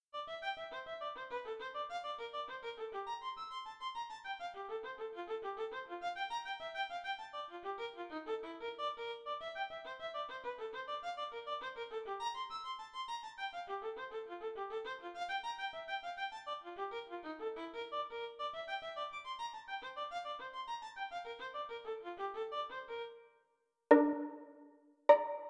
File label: Three Sententiae for Violin